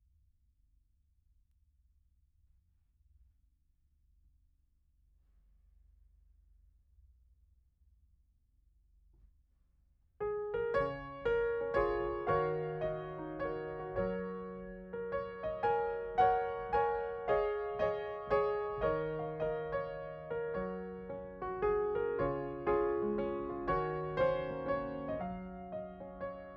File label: Piano Mono